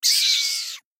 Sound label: Pet Possum Roar; BTD6